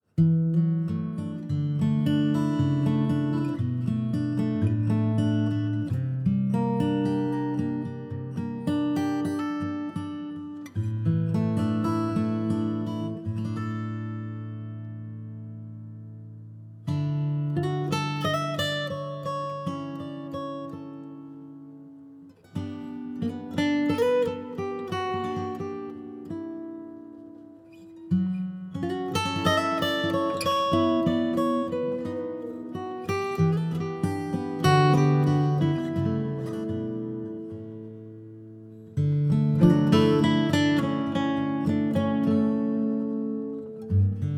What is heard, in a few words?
Guitar version